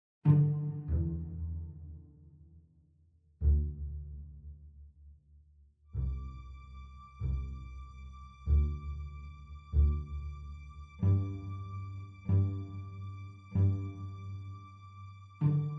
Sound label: Keyboard tutorial, piano; Piano app by Yokee